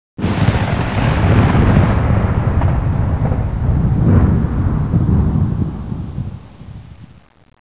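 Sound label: thunder close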